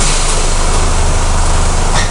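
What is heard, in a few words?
door close, vehicle; liaz5256 25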